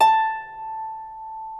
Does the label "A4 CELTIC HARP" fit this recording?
Yes